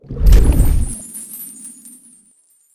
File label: Cursor Potion; the princess and the frog; Swamp